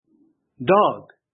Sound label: dog; grade4; vertebrates invertebrates new